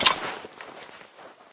smg clip out; Left 4 Dead; Source Engine

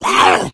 hunter shred, attack voice; source engine; left 4 dead 2

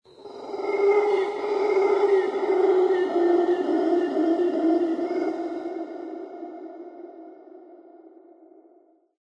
animal call yeti, lair; Source Engine; tf

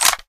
rifle slide back; weapons; source engine; left4dead2

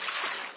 wade, footsteps, run

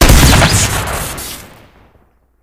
backshot shotty crit; tf

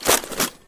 taunt jackhammer extend; video games; source engine; tf

